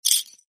ui items bottlecaps up

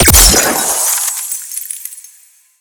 freeze hit

